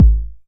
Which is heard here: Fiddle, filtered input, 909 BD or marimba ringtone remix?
909 BD